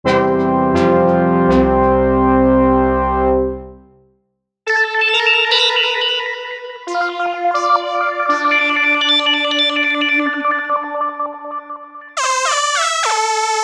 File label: synthlead